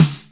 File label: good snare drum d sharp key, acoustic snare sound tuned to the D# note; snare drum samples; one shots